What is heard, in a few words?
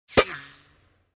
Sport Shooting Gun Rifle Bullet Ricochet Hits Can Pings Off Wizby